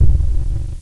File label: Explosion, Retro Game Weapons Sound Effects